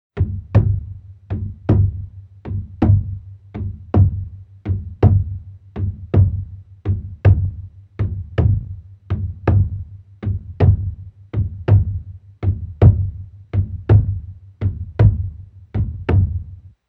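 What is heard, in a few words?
Heartbeat, game music; Samurai Shodown; Samurai Spirits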